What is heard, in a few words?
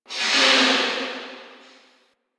Rail Jack Destroyer Machine One Off; Ambience; Crew Ships; Grineer